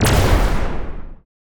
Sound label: laser; spacemap; lasers